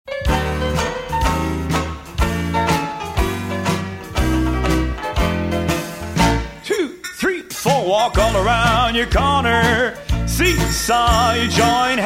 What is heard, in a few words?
vocal